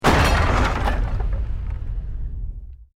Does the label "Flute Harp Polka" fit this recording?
No